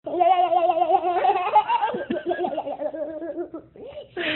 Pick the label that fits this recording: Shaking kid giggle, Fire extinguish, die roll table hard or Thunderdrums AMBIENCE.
Shaking kid giggle